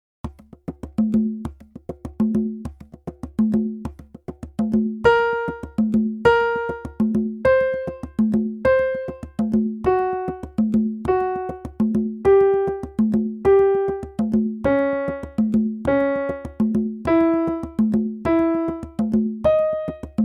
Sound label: random Pitch Practice